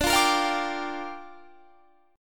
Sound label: Bb 6th D